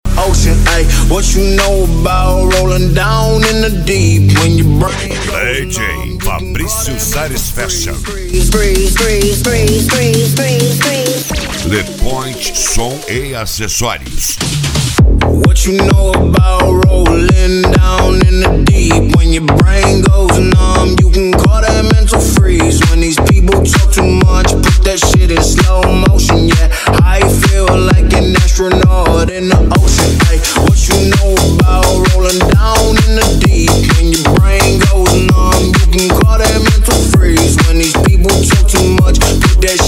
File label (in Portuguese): deep house e pisadinha; le pointe som e acessorios; funk